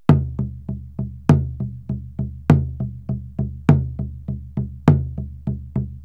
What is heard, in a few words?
tomtom, music comedy; KABOOM! - Wild and Wacky Sound Software